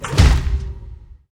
attack

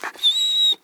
Dog die Whine

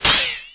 night witch impact

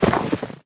snow golem idle

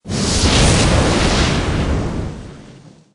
fire ball

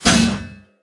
megaknight hit

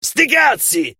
hurt vo

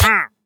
wandering trader hurt

